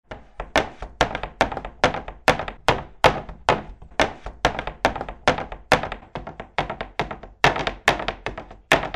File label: Wooden rattling and vibrating earthquake designed